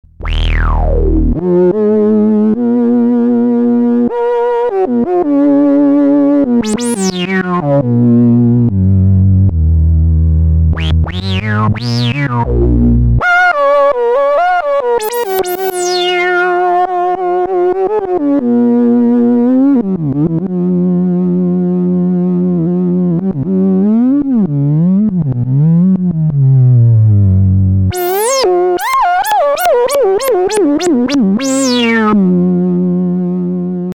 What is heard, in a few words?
Solo Lead